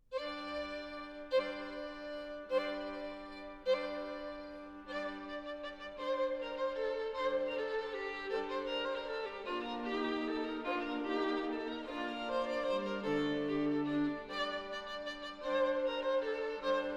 Alla Mazurka. Allegretto

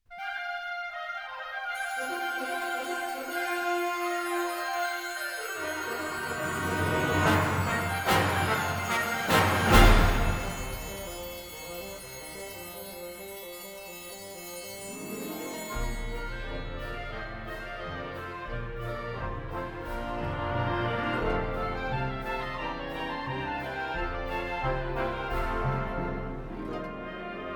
Prelude; Schwanda The Bagpiper

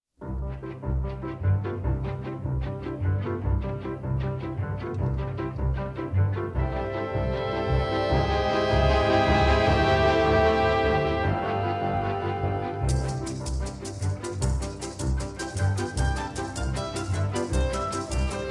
Jamaican Rumba

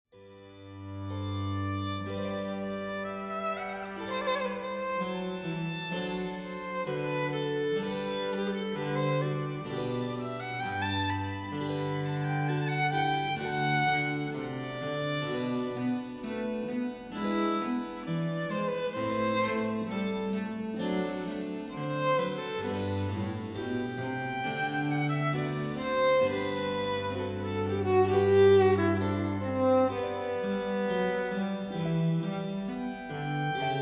Violin Sonata In G Major; Sonata in G major for Violin and Continuo, BWV 1021; 6. Adagio

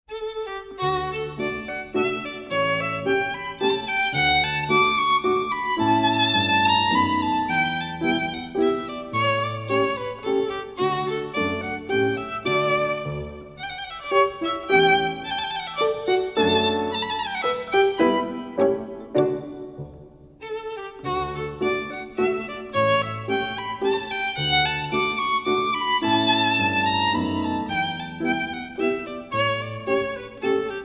Menuet D major

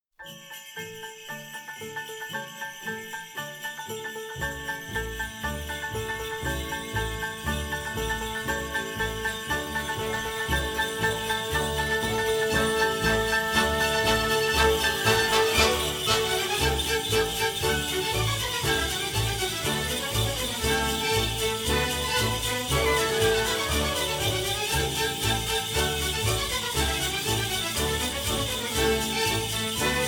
Brazilian Sleigh Bells

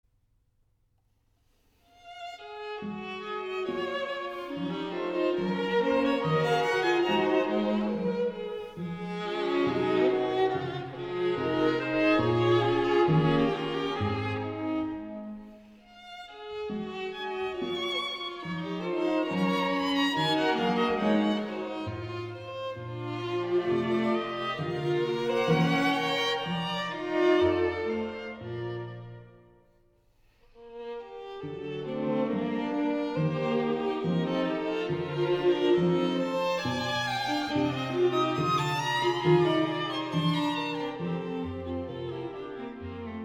String Quintet F-Dur Finale

